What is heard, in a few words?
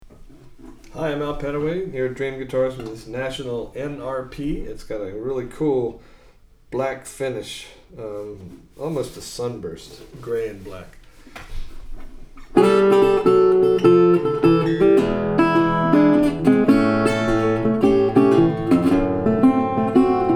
national nrp-b 12 fret, steel string guitars; national reso phonic